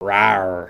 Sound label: Dragon Rawr; emotes